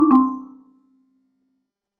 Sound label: sound wrong